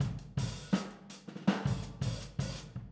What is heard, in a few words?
Boogaloo Fill Overhead ST, 82 BPM, Drums; Sonic Foundry (Sony Creative Software) - Drums from the Big Room The Mixes